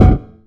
epic metal soft

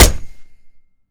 golf hit, golf club; weapons; melee

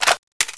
m4a1 clip in; weapons

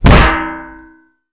shovel hit

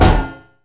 epic metal hard

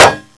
hammer strike wall

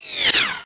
bullet L to R slomo, near miss; weapons

